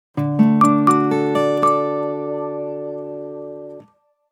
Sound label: Message